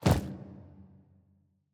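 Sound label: ambience room polygon punchbag training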